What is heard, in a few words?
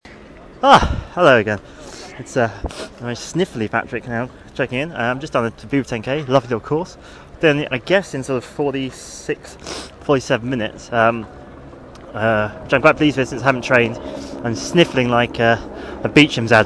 sniffles but happy; bupa